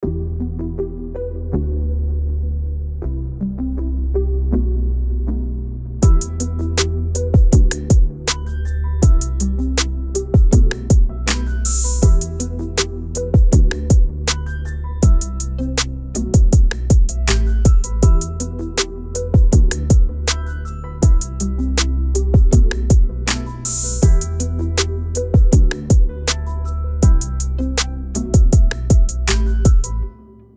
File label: meditative background music for short vlog videos